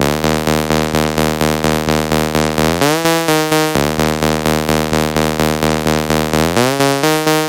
Serum MIDI LD

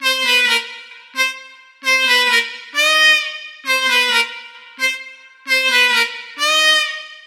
Serum MIDI Sax

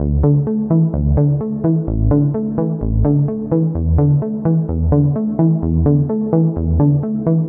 Serum BS Top

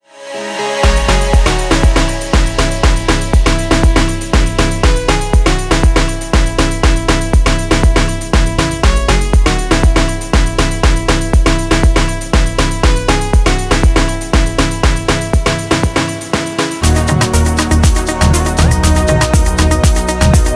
Dj Mix

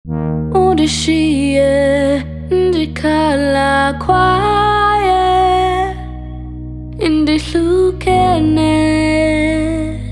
Vocals Mix; Vocals Vol 7

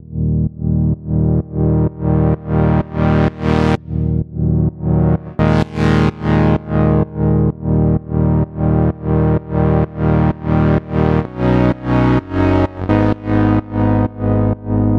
Serum MIDI SY Saw

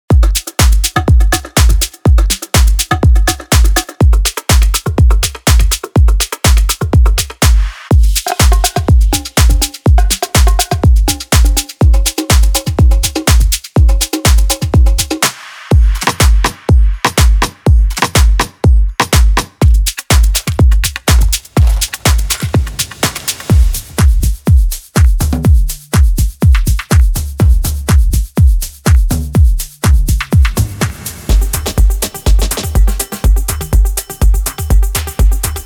Deep Mix

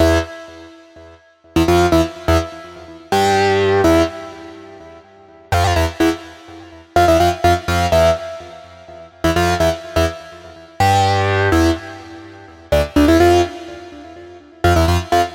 Serum Lead

